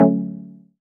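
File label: A Maj6 chord Housey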